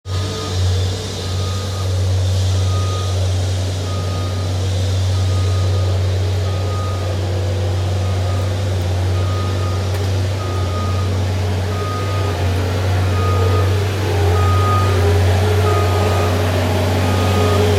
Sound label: Scissor lift